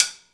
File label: SIDESTICK; Instruments & Percussions; InVision Interactive; Lightware VOL-1